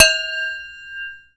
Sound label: BOWL RING; Rarefaction; Poke in The Ear With A Sharp Stick